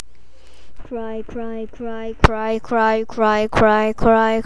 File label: cry